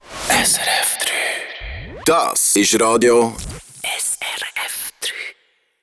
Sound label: Sweeper; SRF3